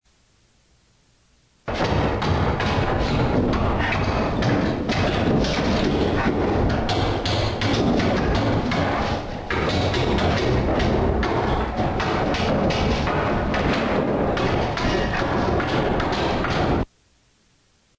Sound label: nail knocking; brown estate edmonds